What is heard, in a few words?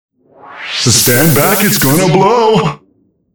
Air High